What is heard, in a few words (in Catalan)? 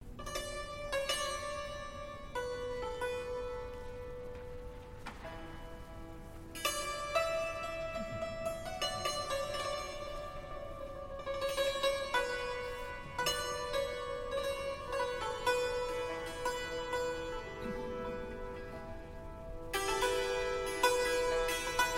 Improvisació santur; Llibre Vermell de Montserrat